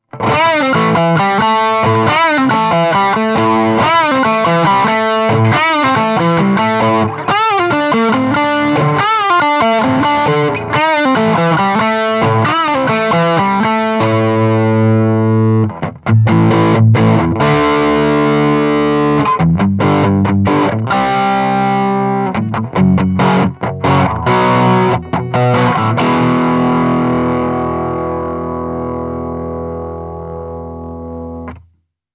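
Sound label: Distorted Guitar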